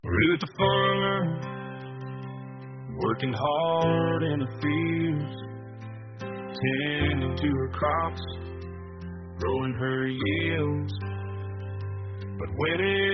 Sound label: farts